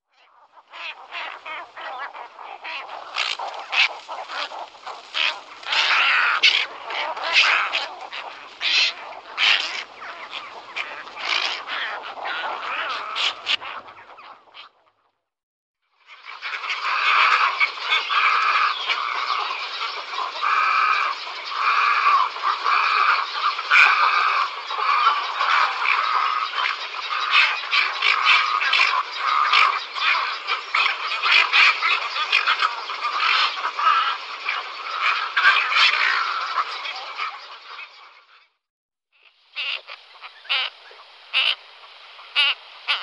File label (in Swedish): Kohager, Faglar